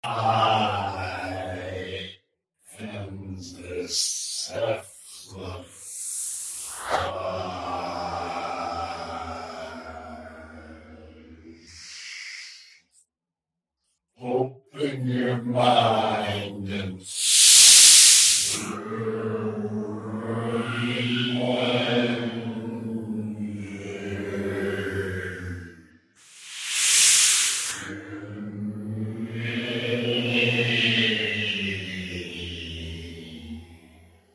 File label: FilterBank